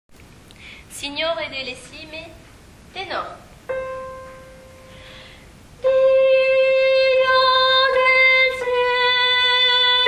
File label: TENOR